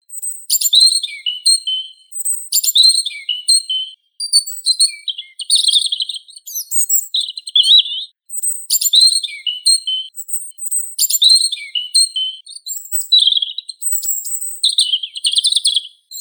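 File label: robin, Erithacus rubecula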